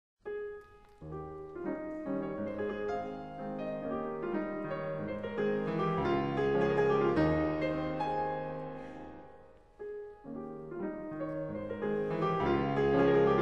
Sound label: Piano Sonata No.2 In B flat minor, Op.35 'Funeral March' 4. Finale Presto; Chopin